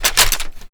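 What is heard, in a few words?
weapon foley m249 reload open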